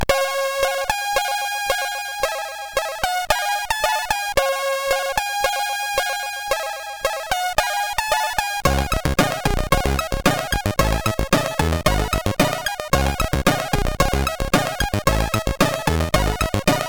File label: Sawtooth Only, CSG8580R5 sid